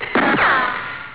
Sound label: shot